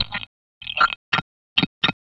Insect Voice, Textures

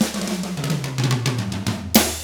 110 SMBA samba; ILIO; Double Platinum Drums 1

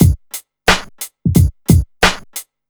BEAT, funk; USB Soundscan vol.47; AKAI; Hip-Hop & R&B

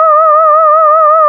LEAD, Nord; Wizoo - Powered Nord; LEAD PAD HRN

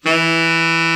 BRA TENOR, SAX; Best Service Gigapack 1; BR SAX 4

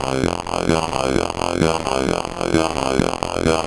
MiniBrute Loop, 130BPM

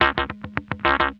Tapped Out B, Guitars; Total Drum&Bass

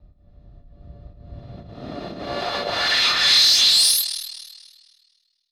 Filter Proc, alarm siren samples, 120bpm; musicradar

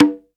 MID CONGA O; Drums & Perc; AKAI S6000 CD-ROM - Ultimate

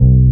DM BAS, Dance Mega Synth; Best Service

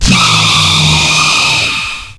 SHIP FX; Big Beat Samples & More; AKAI; OTHERFX KIT

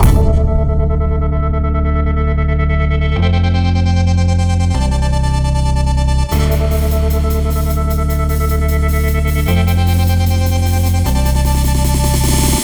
Hardcore Breakbeat, 152 Dmin; Big Fish Audio; Hardcore Breakbeat Action

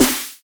SD HOU, Dance Mega Drums; Best Service

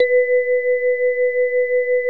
SYNVIBE; DIGI2 FM+SMP

